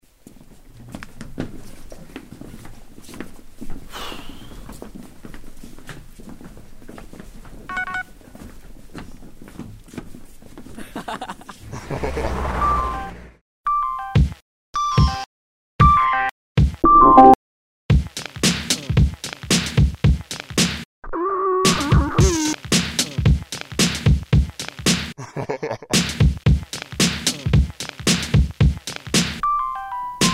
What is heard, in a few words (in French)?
accompagnement